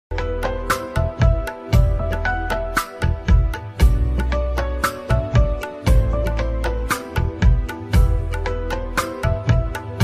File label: bgm ringtone instrument ringtone